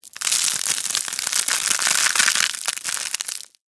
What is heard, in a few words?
Crunch Ramen Noodles; Texture; BlastWave